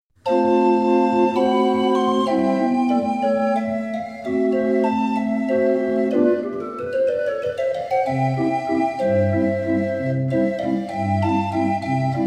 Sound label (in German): 26er Drehorgel